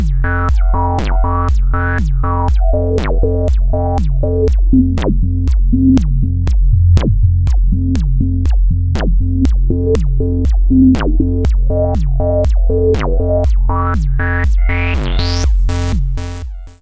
Shruti

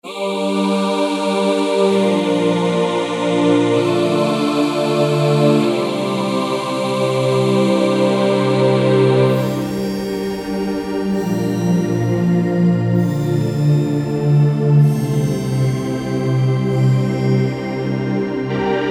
pads

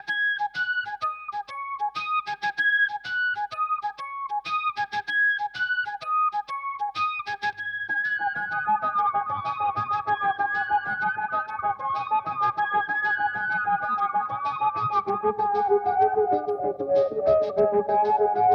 Volca FM

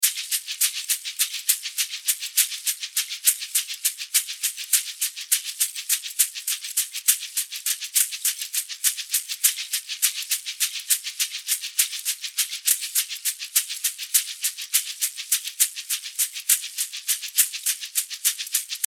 shaker OUT

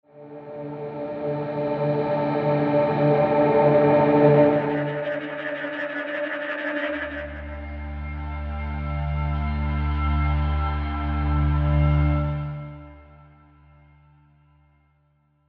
Guitars Ambient Beds and Drones; Scoring Guitars 2

